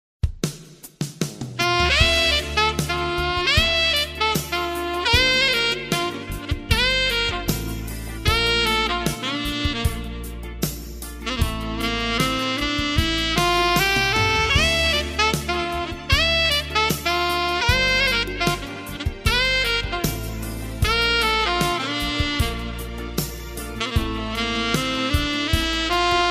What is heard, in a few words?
sax solos; sax solos of all time